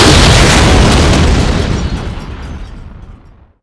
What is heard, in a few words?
metal, explosions